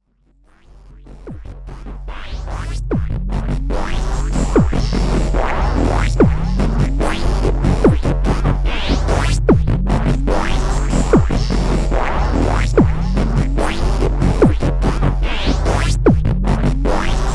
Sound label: Kaossilator noodlings down and or dirtier